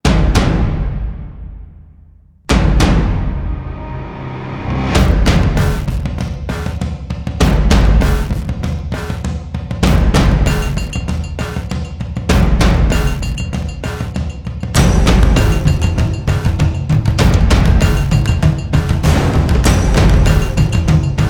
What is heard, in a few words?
Drums